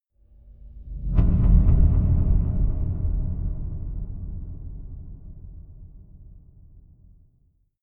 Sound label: reverby dark impact